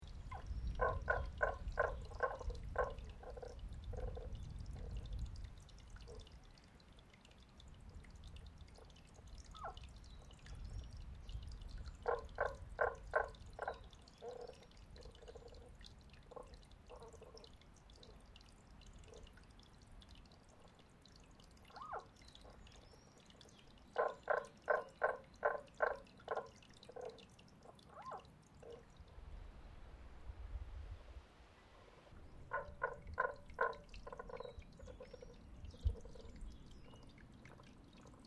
Animal Frog Maybe Mammal Drain Aomori Japan, Strange creature down a drain; Miscellaneous Animal Sound Effects